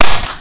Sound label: Fighting Game Hit Sound